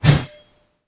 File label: Heavy sword woosh